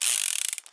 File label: roll